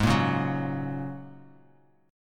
Ab 7th Suspended 2nd Suspended 4th down Bass Standard; Ab7sus2sus4; strummed; chordshape; JGuitar